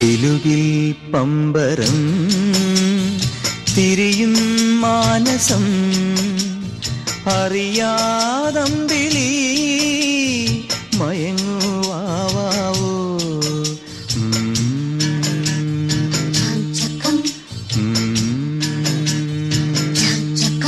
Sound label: kilukkam bgm